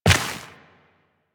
Step Dirt, Footsteps Walk; DE; Warframe; Tenno

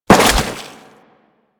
Crewman Step Goo, Footsteps; Warframe; Corpus

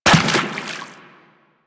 footstep water; Enemies; Warframe; Teshin; DE

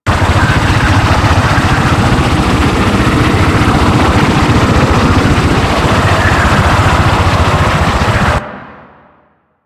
In Flight Loop; Warframe; Liset; Hub

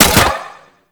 Space Drone Bomb Fire, weapons; Grn Space Drone

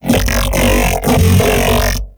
Mech Ambient Vocal; Nechro Mech

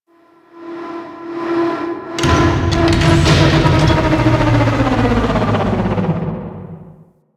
Ship Gen Start Stop; Ambience; Warframe; Corpus Ship Remaster

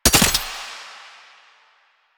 Gunbrella Mech, Weapons; Warframe; Tenno; Koumei

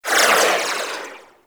Swarm Missile Projectile By; Weapons; Warframe; Sentinel; Helstrum

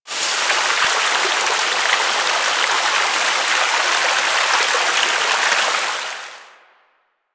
Water Fountain Burst; Ambience; Props; Warframe; Nineteen Ninety Nine

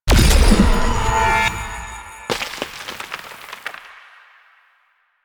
Ground Blast; Duviri; Incarnon Adapters; Incarnon Okina Prime; Weapons

